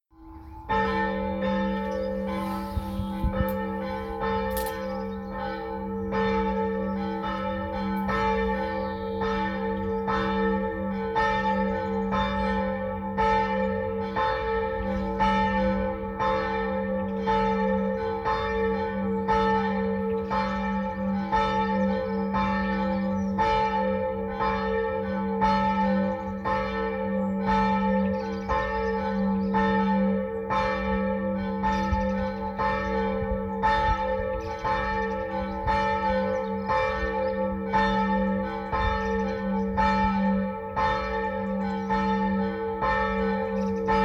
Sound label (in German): glocken altenmedingen; kg wichmannsburg